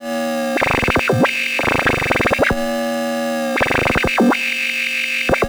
Odd Modular; radiosonics

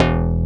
FM Syn Bass, Synth Bass, Roland

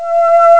bstring; Amiga Soundtracker Sample Packs

